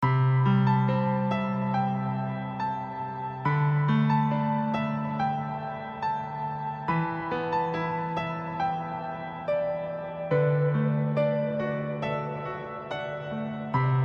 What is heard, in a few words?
scenario sad; Gisou Shoujo to Kyokou no Machi; Android